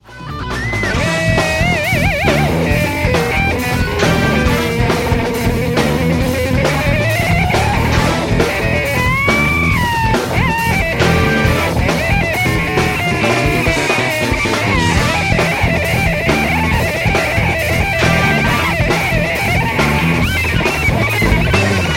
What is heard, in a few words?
trading solos on synth guitar